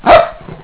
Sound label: dogbark